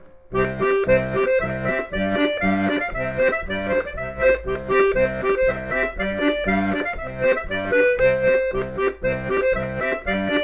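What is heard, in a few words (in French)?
avant deux de travers, accordéon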